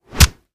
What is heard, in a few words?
Punch Body Hard